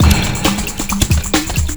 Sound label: water groove